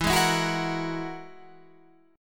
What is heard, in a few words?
Ab 7th Suspended 2nd Sharp 5th, E